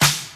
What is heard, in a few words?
sizzle steel snare drum D key; snare drum samples